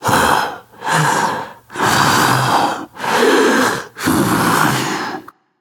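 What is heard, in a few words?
non-verbal breath; smite; voicepack; jingwei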